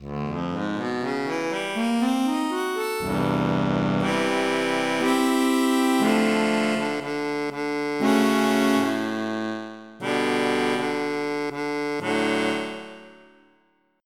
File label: Harmonica Wet